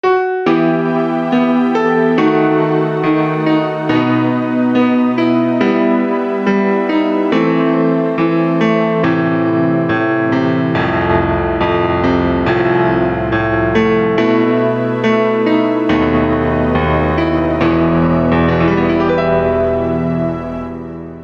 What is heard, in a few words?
piano